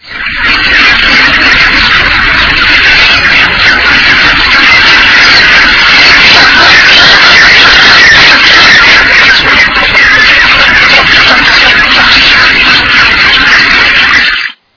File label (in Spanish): pinguino penacho amarillo